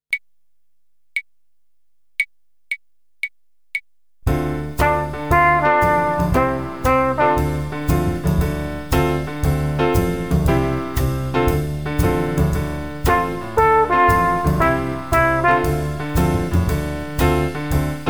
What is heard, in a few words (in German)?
Echo Ubung